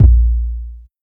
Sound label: warm steel kick drum sound a sharp key, Royality free kick drum tuned to the A# note